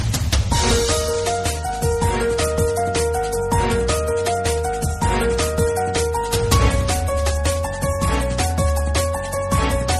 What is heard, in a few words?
suspense; rosa de guadalupe